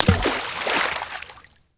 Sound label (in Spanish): roca al agua